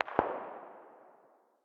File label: dmr very far distance, 7.62x51mm; tacz-tweak-pack; taczmechanics